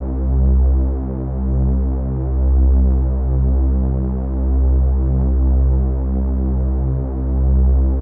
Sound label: deep for dark bass, 808s; CROSH